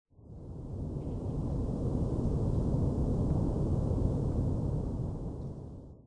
wind

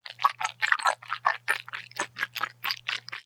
move; svencoop; kingpin

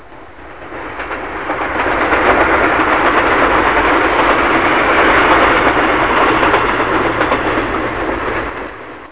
train passing, subway

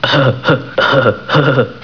laugh

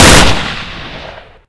m16 burst; firearms

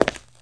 tile, FootSteps

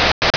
steam sick; svencoop; amopa

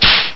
bite attack; rheptor

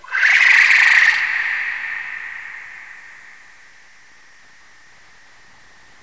wyvern, npc

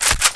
bolt pull, weapons; M41A

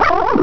pain; svencoop; cryocell

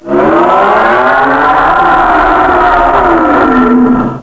roar; gearbox; renesaur

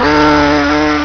slave pain; gearbox; aslave

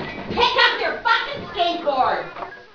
skateboard

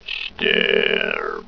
ab alert; svencoop; portal house; aberration

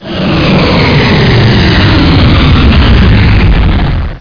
flyby; svencoop

